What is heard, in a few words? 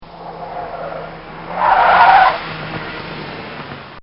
Audi Braking Hard; Cars; Vehicles; Bobby Cole